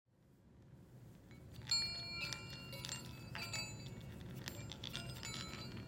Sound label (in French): boule chantantes Qi Gong Yin Yang